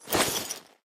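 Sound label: inventory items take all; STALKER; IWP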